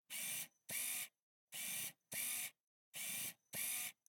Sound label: Camera, Pentax KS2, DSLR, electronic, mechanical, focus, steady, out in, XY; Eiravaein Works; Vaeyan IV